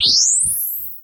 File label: Multimedia Internet CD-Rom Flash Whoosh Multiple